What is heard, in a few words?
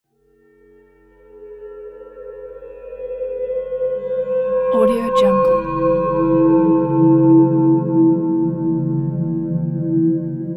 mystical transition; cranberrysound